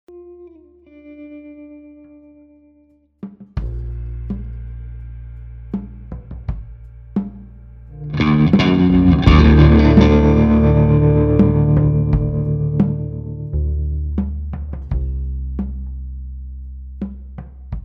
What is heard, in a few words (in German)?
atmosphaerisch Drums Gedaempft Bass GT